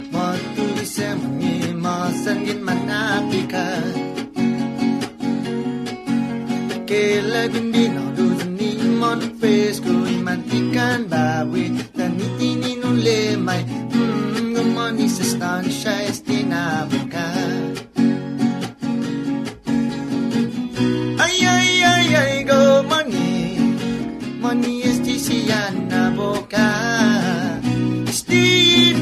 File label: Chamorro Music